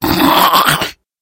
monster goblin

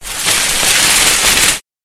monster trifid

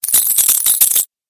monster spider

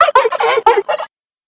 monster turkey

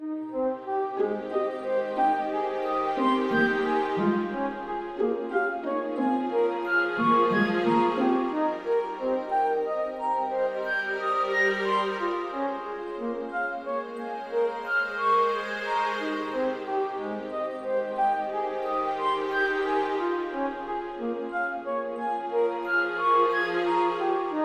wilderness intense forest, music

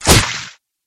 hit bow

